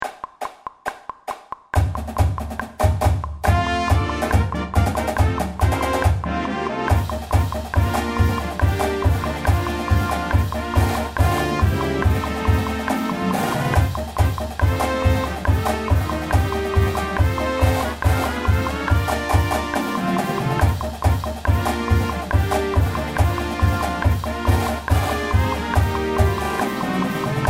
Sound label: Fight Song Midi met